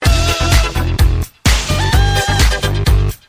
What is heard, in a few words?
instant dance party song